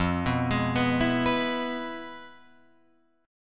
F Minor Major 7th Flat 5th Guitar Open F; FmM7b5 arpeggiated; JGuitar

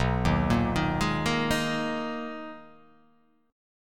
B Major 13th B down

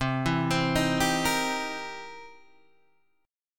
C 7th Sharp 9th, C, down, Guitar, Open G

